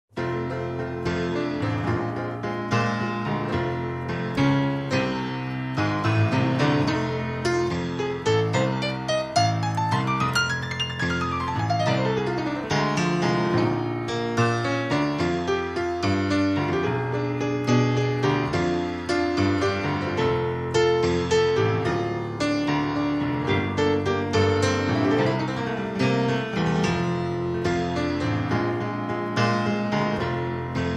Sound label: Mississippi Delta Blues